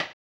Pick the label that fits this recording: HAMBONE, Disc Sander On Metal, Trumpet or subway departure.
HAMBONE